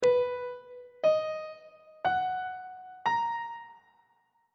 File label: e flat phrygian 7th chord 6 is c flat major 7th on treble clef